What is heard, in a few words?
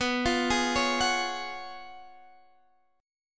Gb Major 7th Suspended 2nd Suspended 4th B, chord